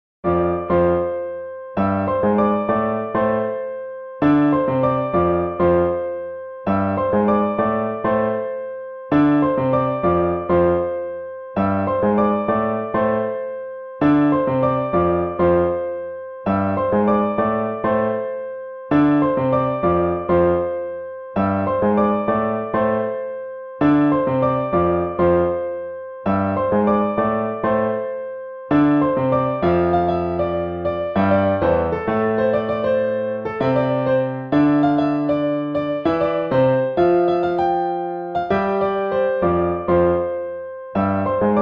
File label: piano instrumental version